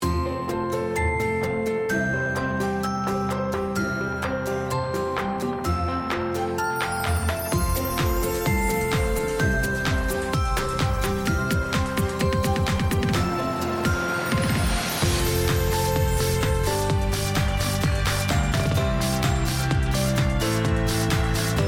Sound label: Bgm Main; Ange Vierge Girls Battle